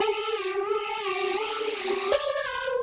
Mod A, 170 bpm, A, modular; modular madness

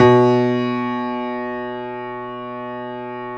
JAZZ, Keyboards Acoustic; USB Soundscan vol.17

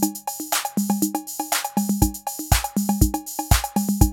CR-8000 LOOP, DRUM LOOPS

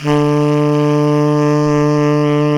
SAX Tenor Breathy D 3

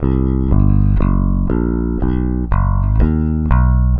SM BS EM, BASS SAMBA

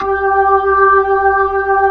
B-3, Jazz

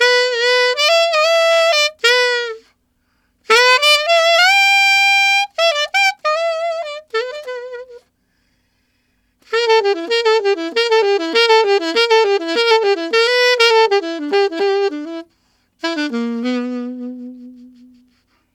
Alto Rock in E min, Sax; Sonic Foundry (Sony Creative Software)

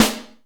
Reverb Kick Snare; PS-37 Dance Synths; Percussion

